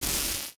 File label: sfx research station lightening Small; Stellaris; nemesis